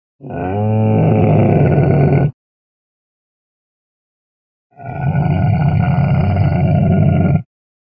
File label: camel